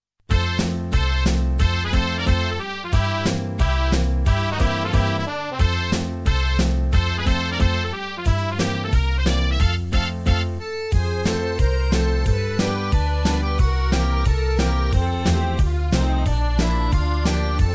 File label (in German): zirkuslieder artistenlied playback